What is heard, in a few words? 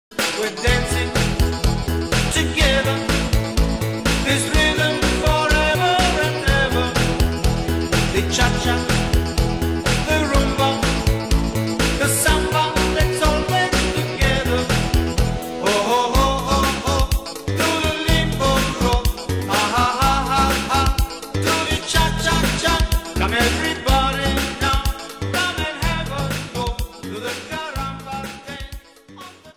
hahaha